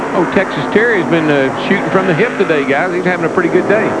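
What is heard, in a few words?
shooting; Darrell Waltrip comments on Terry's good run at Sears Point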